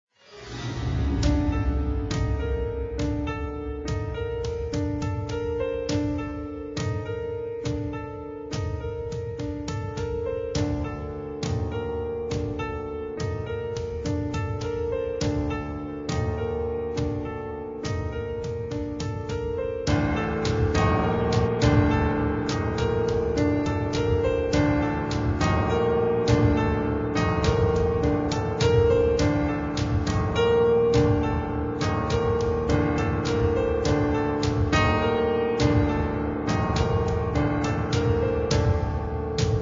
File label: Cave Music; creepy tale